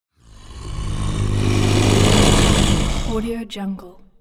fantasy game creatures earth giant monster huge deep